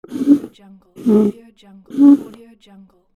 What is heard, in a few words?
drinking straw moving